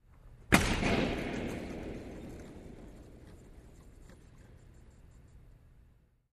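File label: Hockey Body Hit Reverb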